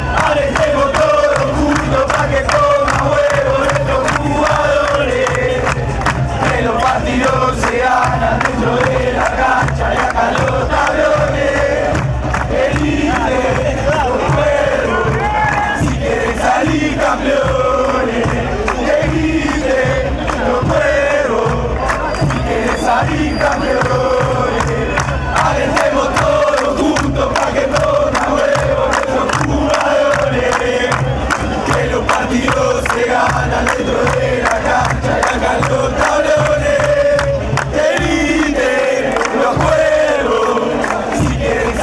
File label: scream, chants